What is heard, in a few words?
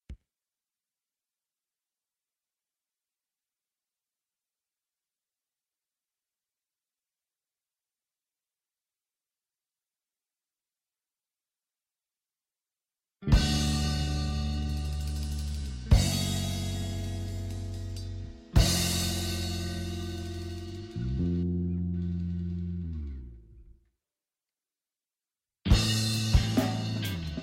song